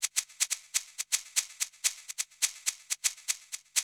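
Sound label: percussion, house, 125; eJay; Drum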